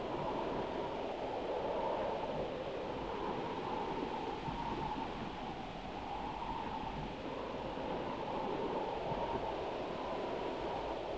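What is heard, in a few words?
Ambience ravine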